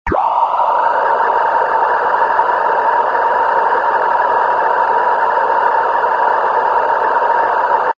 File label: Wind